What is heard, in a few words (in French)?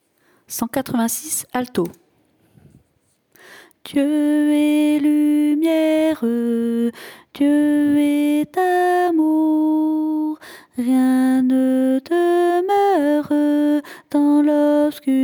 alto